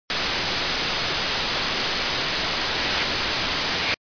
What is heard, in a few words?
radio static; garrysmod